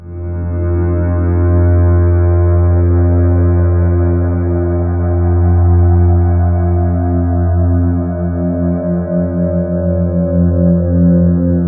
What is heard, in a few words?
SPACE, Ominous Drones, SYNTH SND; USB Soundscan vol.48; AKAI